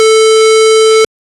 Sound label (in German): warn ton